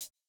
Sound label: Jrock hihat